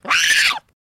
Baboon Scream Wail

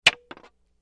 Wood Staff Hits Multi

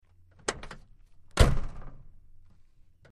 Wagoneer Rear Tailga, jeep wagoneer

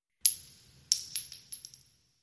Shell Casings Single

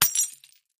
Glass Shot Glass Cras

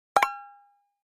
Pop Up Dbl High Guitar, pop up element

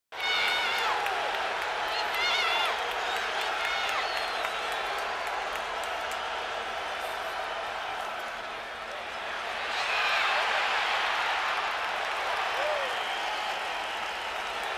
Football Crowd Chee, sports arena football

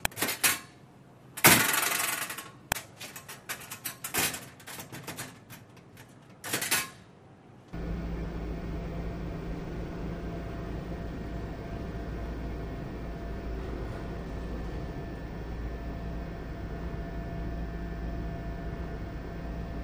Elevator Door Close

